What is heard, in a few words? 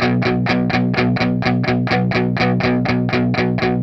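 RHYTHM 125, Vintage Blues Guitar; USB Soundscan vol.22; AKAI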